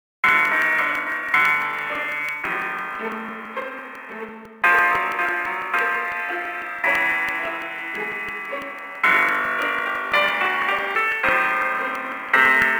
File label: Funny Cartoon Spot; dying light gamerip 2015